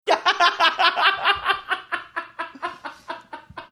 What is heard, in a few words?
having good laugh